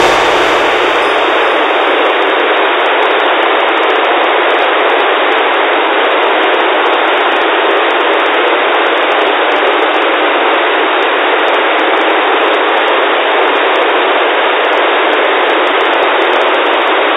recording unlicence walkie talkies 446 MHz

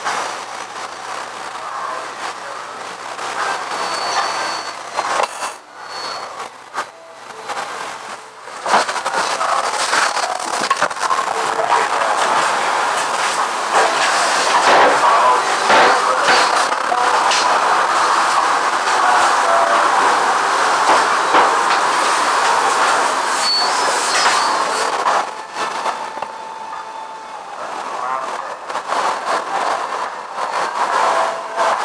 directional speaker with parabolic mic recording

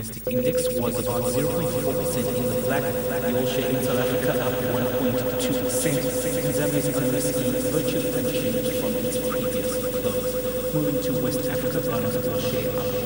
binaural tone and sky news modulation; The above tonal modulation was then played on the computer speakers on low volume and then record.

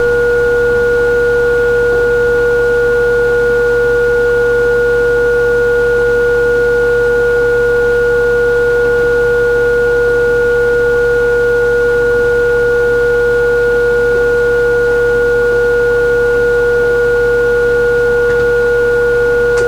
recording tone modulating with thinking

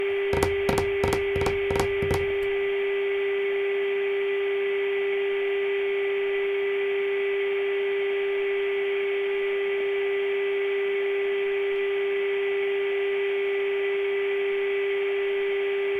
recording handsfree tapping

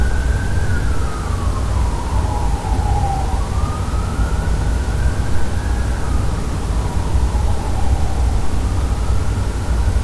background noise

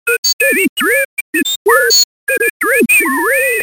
sinewave synthesis

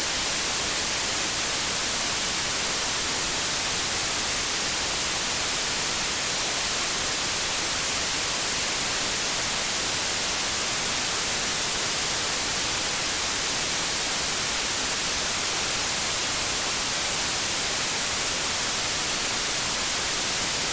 artificial white noise tone

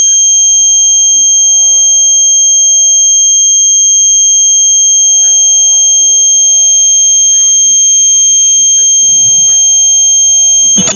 recording virtual mic combo

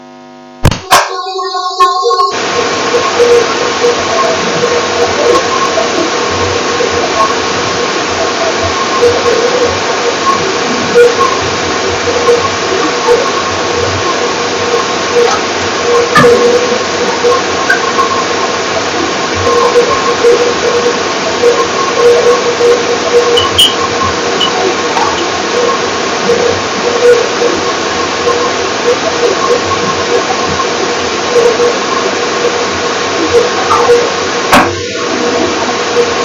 parabolic mic hear a combi boiler

